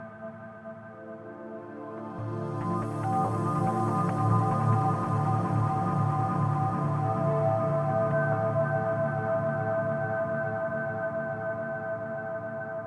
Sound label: techno pad 140; Techno2; Keys